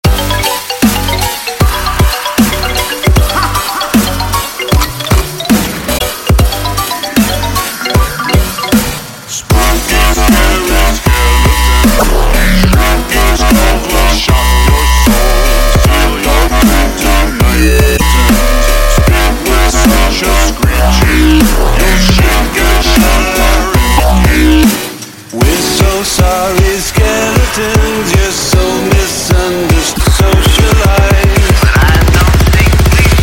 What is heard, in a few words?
Dubstep Remix